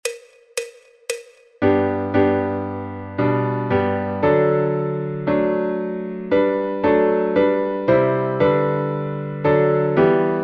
Piano en Sol Mayor

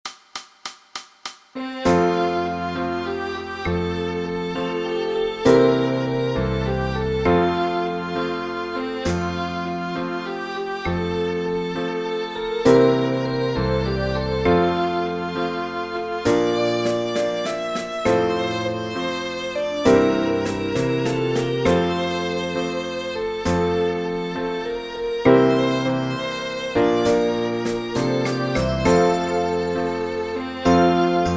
Violin en Fa M Villancico; Cantando van los pastores

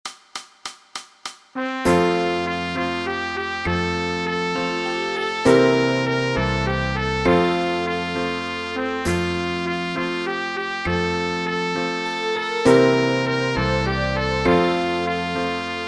Trompeta en Sol M Villancico; Cantando van los pastores; Partitura de Trompeta

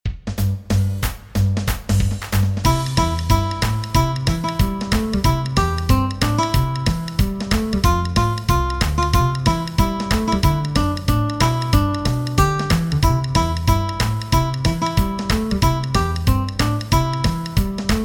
Guitarra Punteo en DO Mayor; Jingle Bells Jazz; Tablatura con números

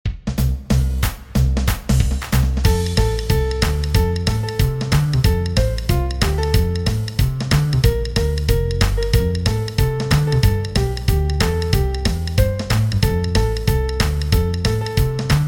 Jingle Bells Jazz Fa Mayor F; Solfeo Ritmo y Entonación

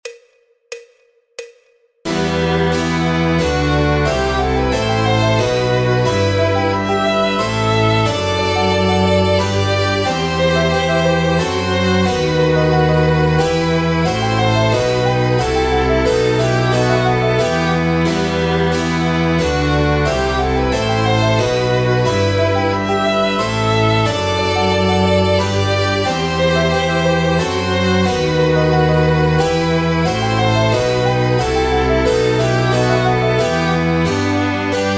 Piano Clavecín o Teclado en Mi m; Rondeau Abdelazer Purcell

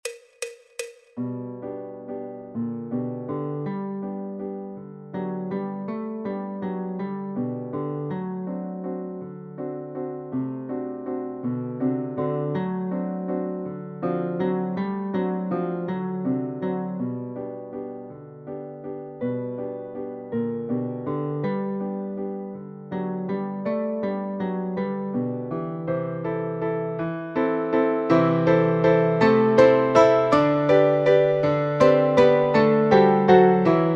Punteo de Guitarra Sol Mayor; Sobre las Olas; Over the Waves; Tonalidad Original

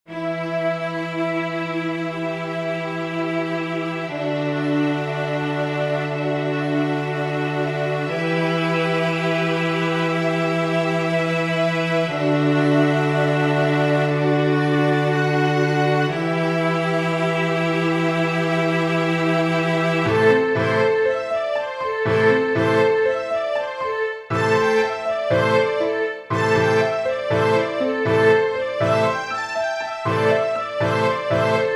Pequeña Orquesta de Cuerda y Piano; Fantasía Tartesa; diegosax

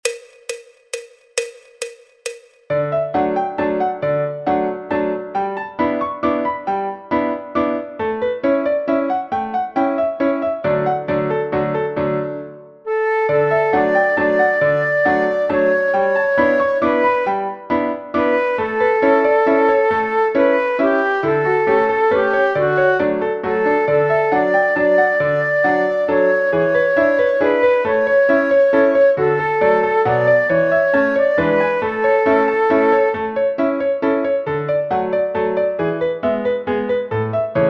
Dúo Flauta Travesera y Piano Acompañamiento; Don Giovanni

